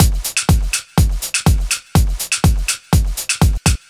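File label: 123 Minimal Funk No Snare, Drum Loops; 123bpm; Nu Dance Electro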